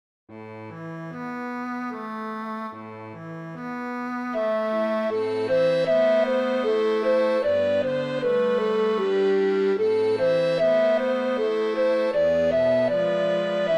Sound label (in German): Zwischenspiel